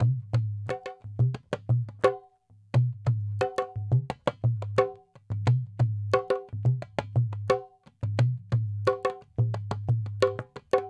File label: dholak 088 A1, Hip Hop India; 088bpm; Hip Hop India Modern Percussion; Apple Loops